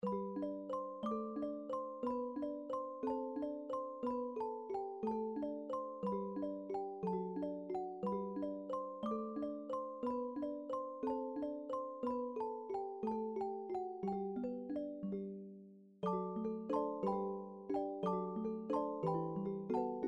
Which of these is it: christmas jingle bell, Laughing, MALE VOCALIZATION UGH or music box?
music box